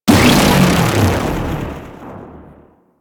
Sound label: UI Common Level Up; Warframe